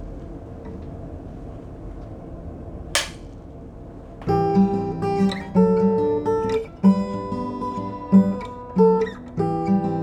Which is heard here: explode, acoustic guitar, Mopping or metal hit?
acoustic guitar